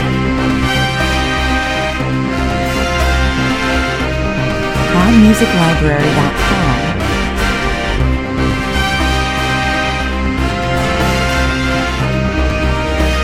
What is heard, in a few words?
brass loop